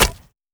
archer bow hit other

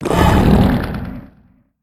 Potion drink

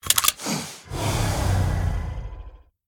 Gunshot Warning Idle; Devour; The Town

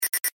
Warning Beep

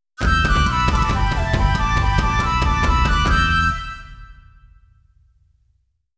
Tie Game Jingle; tokimeki memorial 2

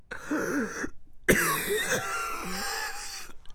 Cough

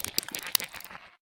Chitter; A Robot Named Fight

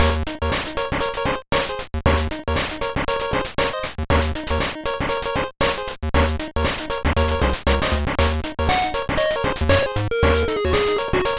Main Menu ~Chiptune Version~; one piece going baseball kaizoku yakyuu

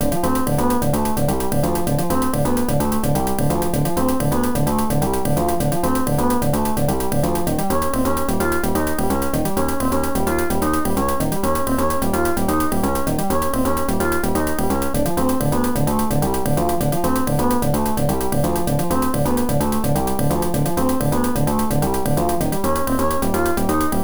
Boss fight; the mon mon monster; opll msx2 gamerip

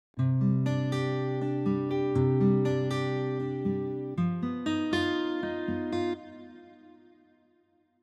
spookytown lowkey head 120bpm EbMinor ish 14beats

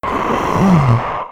Soldier Twitch; 1916 der unbekannte krieg gamerip

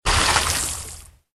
Water Splash

